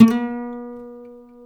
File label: C 4, Guitars; MARTIN 6